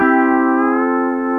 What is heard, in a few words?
PEDAL STEEL